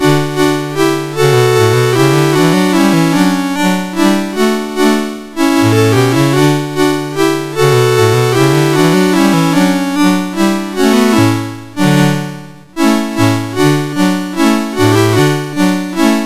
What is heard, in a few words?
Chorale, MOS6581R2